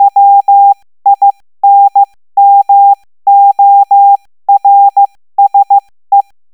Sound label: 13 wpm arrl farnsworth, morse; winmorse